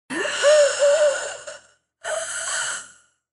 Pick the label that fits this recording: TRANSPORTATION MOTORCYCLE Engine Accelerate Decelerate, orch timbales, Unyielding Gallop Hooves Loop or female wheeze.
female wheeze